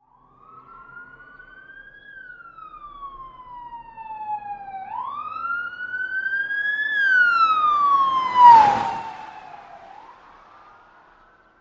Police Siren: Fast Car By, with Various Types And Engine Noise.